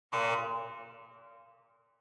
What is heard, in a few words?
Electromagnetic Buzzer Hall